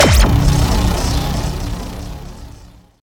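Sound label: Sci weapon Shot Beam Large Industrial Grinder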